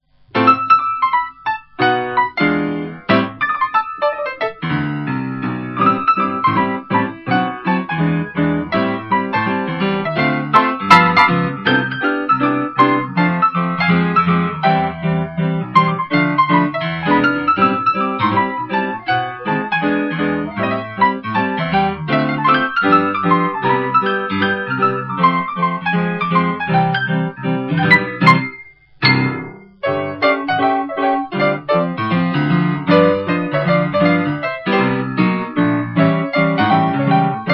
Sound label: piano boogie